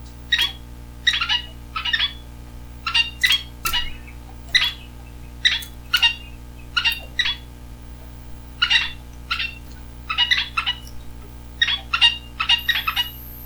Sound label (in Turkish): baharat ispinoz sesi